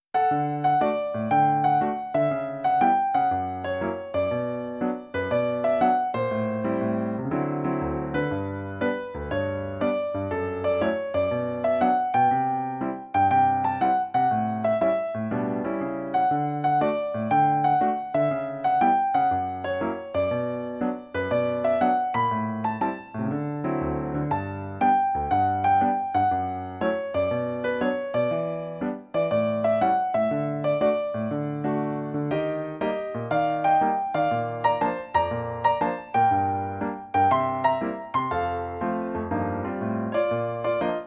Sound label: Piano Arrange Version; solfege vocal collection